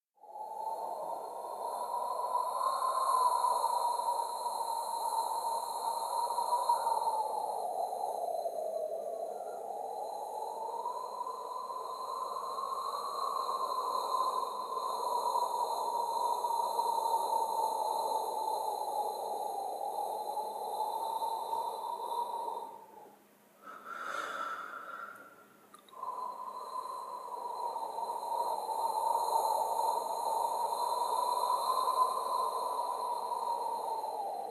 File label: wind; Syrinscape unplugged; Jungleplanet dinolaser battle